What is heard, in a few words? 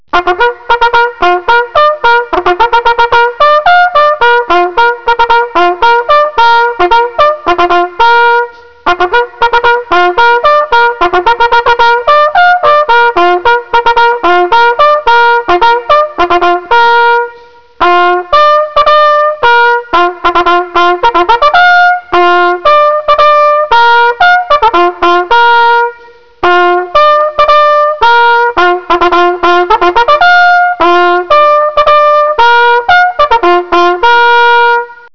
Drunken soldier, Bugle calls; American Civil War